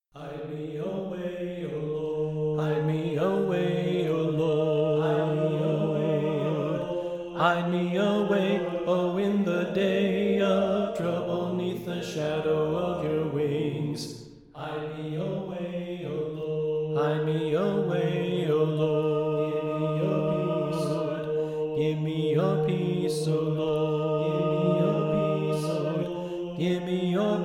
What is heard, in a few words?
Soprano Left